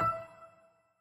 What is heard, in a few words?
piano; osu